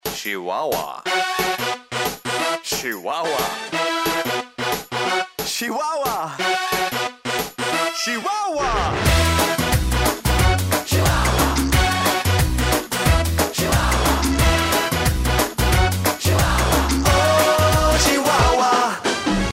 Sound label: ringtone